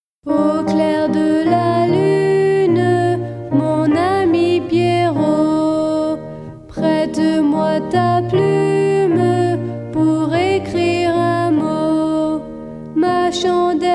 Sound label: Traditional Song; Bien-dit level 1B